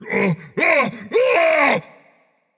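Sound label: non-verbal fear; smite; atlas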